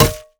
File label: basic attacks; Dr. Mundo; TPA Mundo